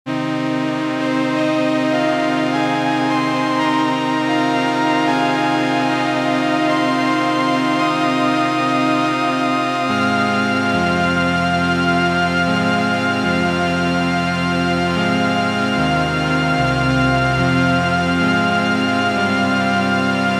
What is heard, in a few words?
Albino Synt orchestra Poly Viola